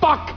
metal, debris; svencoop; cracklife

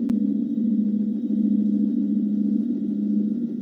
robo idle

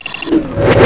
button; svencoop; cracklife; buttons

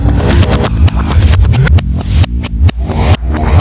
suit charge; svencoop; cracklife

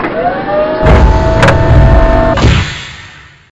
tank hatch; svencoop; svencontra2; stage2

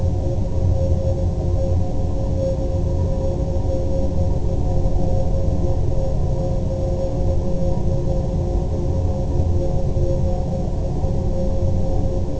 storage; svencoop; santas workshop

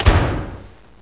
door stop; mistake coop

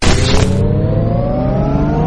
egon windup, weapons; svencoop; legends

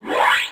item spw, items

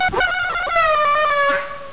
alert, gonarch; svencoop; cracklife

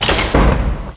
meduse, doors; svencoop; quake1

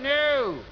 door stop; svencoop; cracklife

